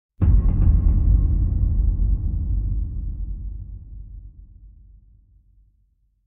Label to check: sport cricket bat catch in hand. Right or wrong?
Wrong